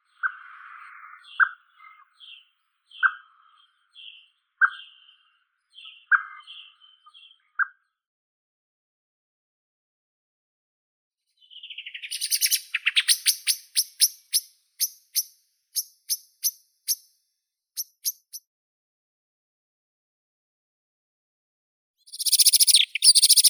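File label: blackbird, Turdus merula; drozd chernii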